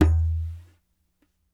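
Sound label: Large Dumbek Medium; Sony CS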